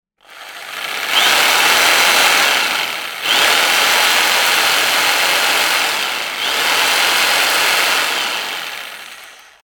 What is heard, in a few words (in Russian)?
zvuk jelektrolobzika